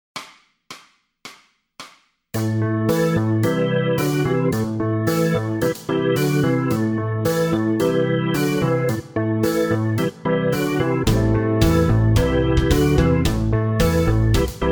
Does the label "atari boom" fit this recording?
No